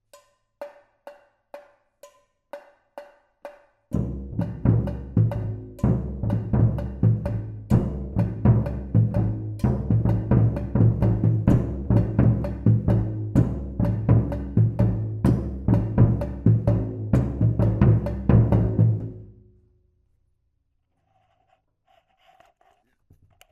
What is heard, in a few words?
Surdos, marcha